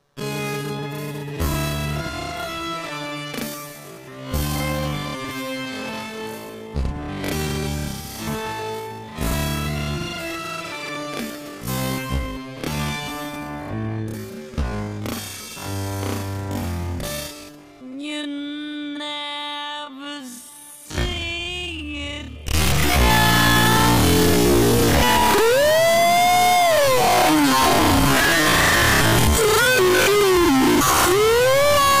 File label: whooa